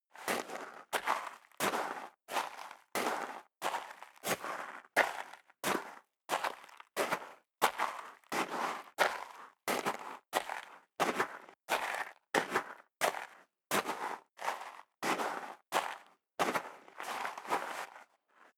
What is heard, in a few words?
step leather shoes gravel light walk sequence